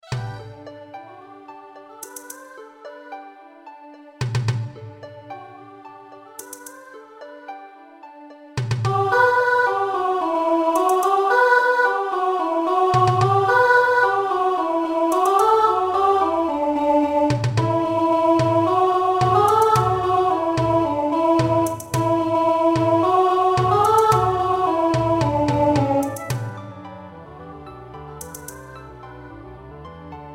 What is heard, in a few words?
Alto